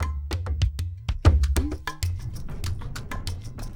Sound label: Chunk Vase, Drums; Sonic Foundry (Sony Creative Software); Friendly Fire; Marc Steve